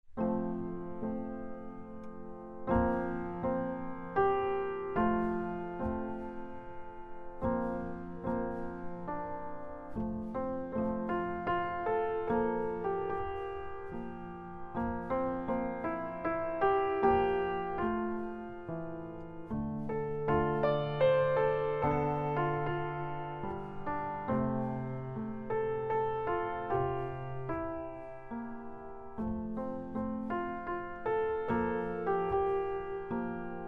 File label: piano